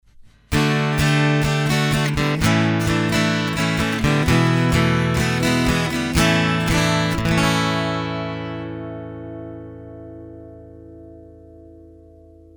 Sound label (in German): Gitarre